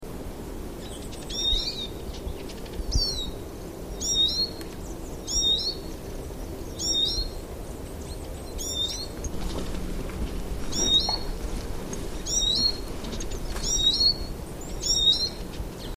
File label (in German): erlenzeisig